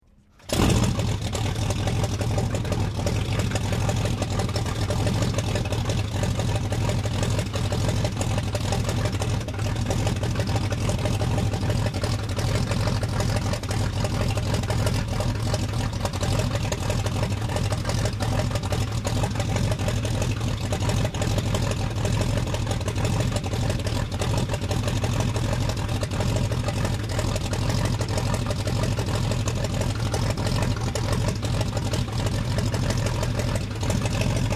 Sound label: AIRCRAFT 1916 SOPWITH PUP REPLICA STARTUP IDLE MIC A